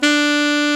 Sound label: SAX A. MF D, Alto Short